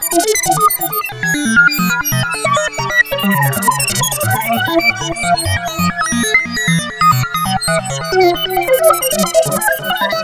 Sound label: psy arp edgy sequence dm 135 bpm; synth; instruments; loops